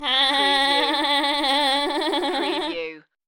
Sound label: Annoy Laugh